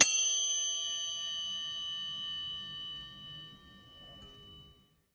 bell

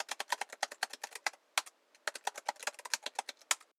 Keyboard

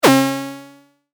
game effect

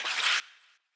paper

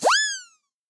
Run

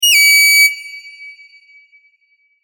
correct; 正解６１（うにゃっとしたピンポン。その１。）

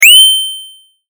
sword

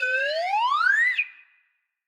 whistle UP

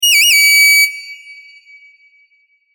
correct; うにゃっとしたピンポン。その２。; 正解の音・ピンポーン

